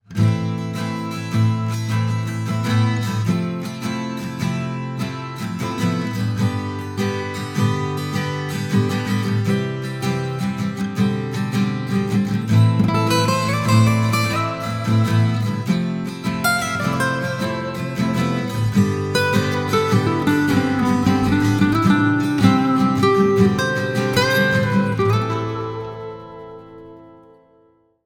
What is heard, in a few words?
Acoustic Guitar; AKG P420